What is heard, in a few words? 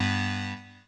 synstb, Synth